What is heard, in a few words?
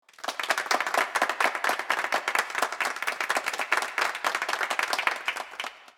lose